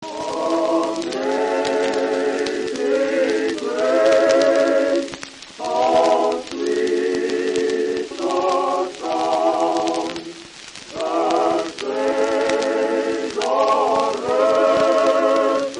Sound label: amazing grace creepy